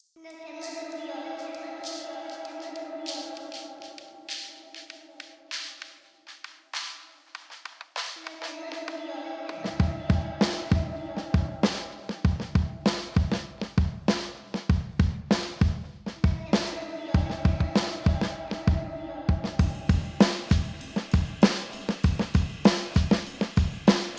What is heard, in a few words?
DRUM